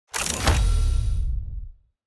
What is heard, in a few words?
Drone Gravitrone Start; TANK; 3Souls